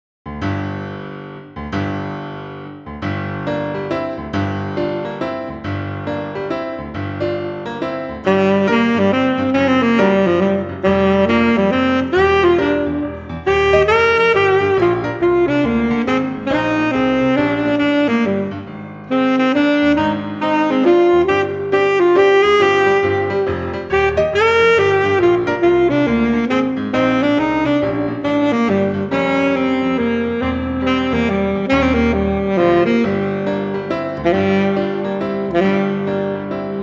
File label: First Rep Hooligan Strain Full Sax